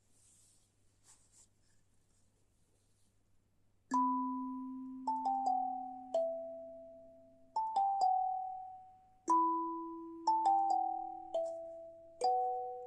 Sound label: kalimba; kalimba tutorial